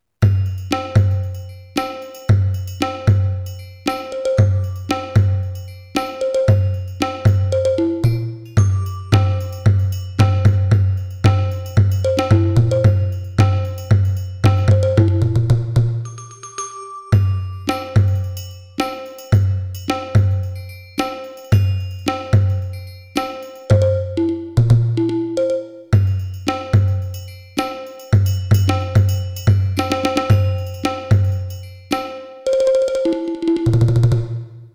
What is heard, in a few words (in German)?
ALESIS Debut Kit Beispiel 9 Percussion